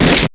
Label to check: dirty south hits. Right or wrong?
Wrong